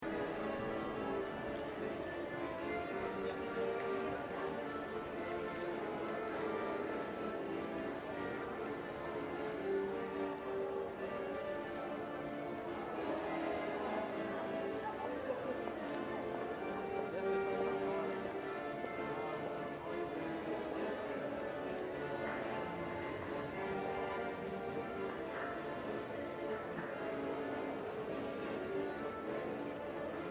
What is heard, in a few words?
City Musicians Parliament, Street musicians playing some sort of russian inspired folk music; on accordion and tambourine. Medium street crowd outside Stock; City Sound Effects; Lynne Music